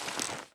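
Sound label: foliage footstep; folliage footstep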